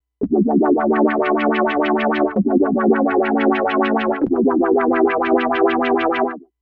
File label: Wobbly Chords, mk